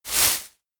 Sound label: Leaf